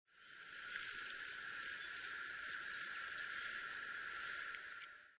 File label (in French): roitelet huppe, chant